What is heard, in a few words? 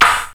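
SD, snare drum; X-Static Goldmine 1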